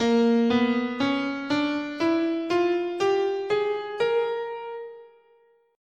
A sharp Diminished Half Whole, scale

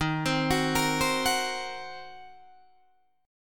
Eb Minor 6th, Guitar DADGAD; Ebm6 arpeggiated

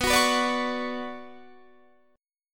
B Minor Add 9th B, chord; Bmadd9 strummed; madd9:1,b3,(5),9; JGuitar

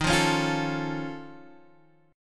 F Augmented 7th D sharp, chord

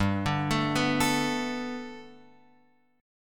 G Major Add 9th Guitar Open F, chord; x,2,2,4,2,4; Gadd9 arpeggiated

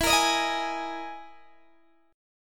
E Major 7th Flat 5th E down Guitar DADGAD

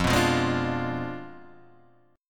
F Diminished 7th F down Guitar Half Step Down

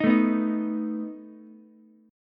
A Suspended 2nd Flat 5th A down Ukulele; Asus2b5 strummed

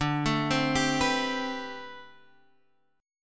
D 13th Suspended 4th D; D13sus4 arpeggiated; JGuitar

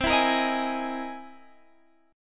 C Augmented 7th Sharp 9th C

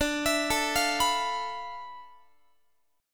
D Minor 6th Add 9th D, Guitar; Dm6add9 arpeggiated; x,22,19,19,21,22

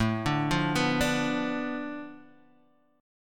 D Minor 6th A, guitar chord

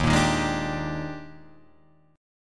Eb Major 11th, chord; EbM11; strummed; JGuitar

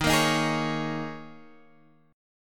D sharp 7th Suspended 4th D sharp, Guitar Open G

